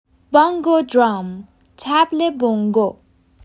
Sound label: Bongo drum